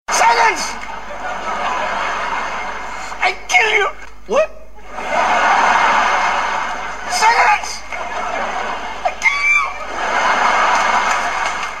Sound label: classroom shout